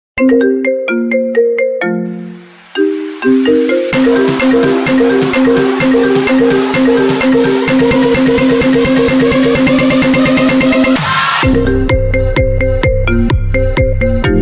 iPhone Bass Drop Remix

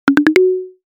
Stock Notification; Cycle; HTC 10 - Sense 8 Sound Suite

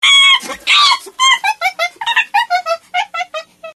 Monkey Sound

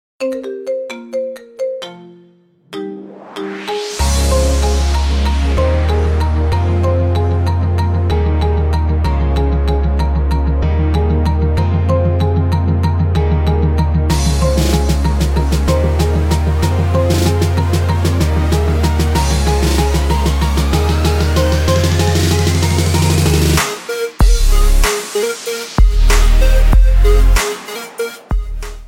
Marimba; Marimba Remix

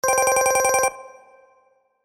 SMS; Samsung; S6

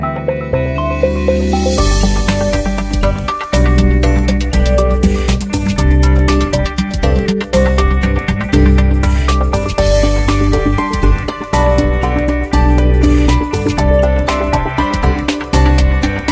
samsung song 3, klingelton

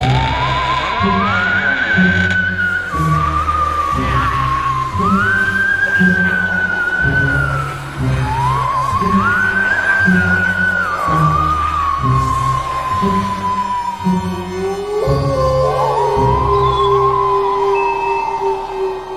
Spooky Halloween, Klingelton

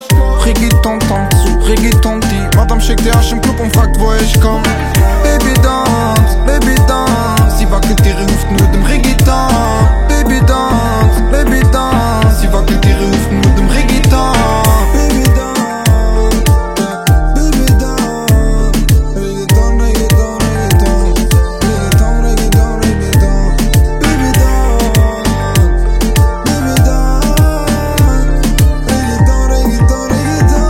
reggaeton

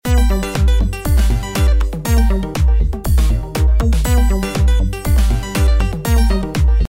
Squinch SMS

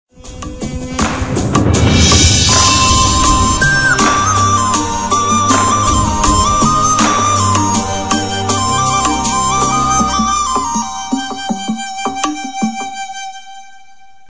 iPhone Ring; Klingelton

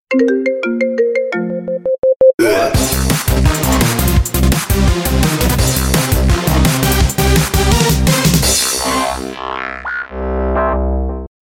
iPhone Tones Trancy Marimba; Klingelton

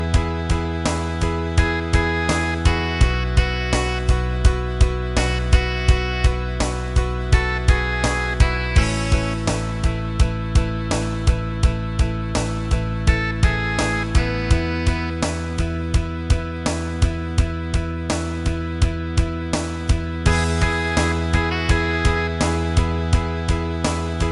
Polyphonic; Klingelton; Samsung New Polyphonic

